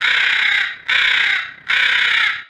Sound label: bird caw long; triple-aaa-games